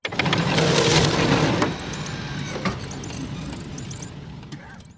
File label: event administrative work; Stellaris